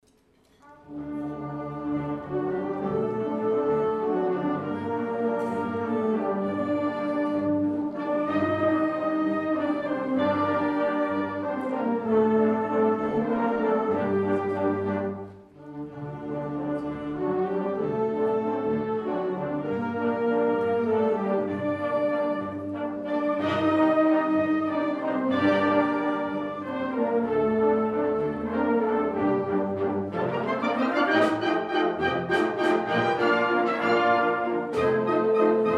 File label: Waltz; Danube Waves